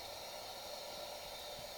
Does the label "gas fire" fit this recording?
Yes